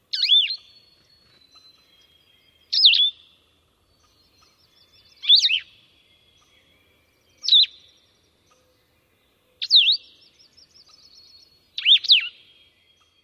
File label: song